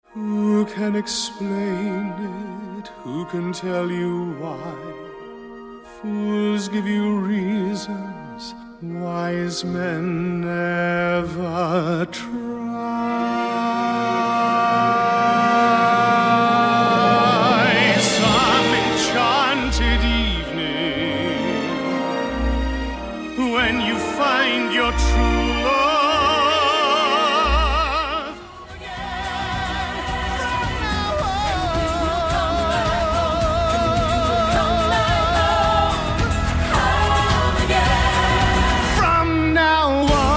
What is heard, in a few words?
singing